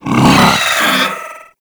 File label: Pet Vocal Pain; Warframe; Alad V Pet